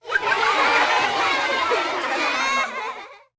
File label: slide factory; maggie